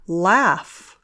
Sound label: laugh